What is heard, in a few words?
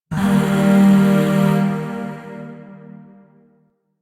Shawzin Blues Chord B; Chords; Warframe; Zariman; Void